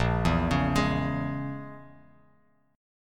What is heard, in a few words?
A sharp Minor 7th Flat 5th A sharp, Bass Standard; arpeggiated; 6,7,11,13